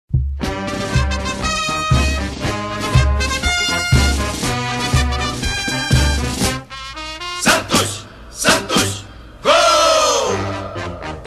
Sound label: Santos Anthem